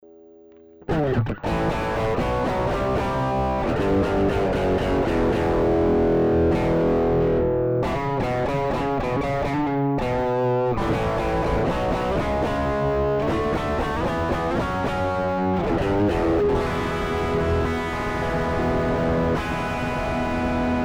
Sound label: Distortion Pedal; Tech 21 QStrip Off